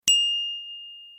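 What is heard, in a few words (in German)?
Fahrradklingel Zoo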